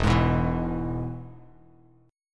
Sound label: E-Major E, Bass Standard; strummed; JGuitar